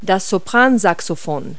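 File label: soprano saxophone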